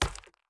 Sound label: Enemy Clicker Walk